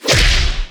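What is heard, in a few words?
Battle Mace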